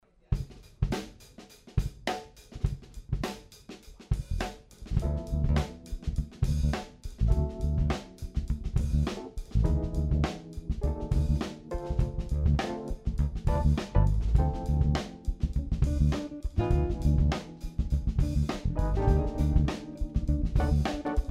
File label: vibes